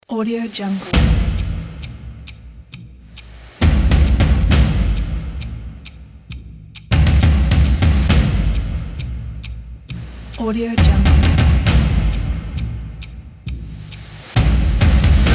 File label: short cinematic action drama trailer; choclatefix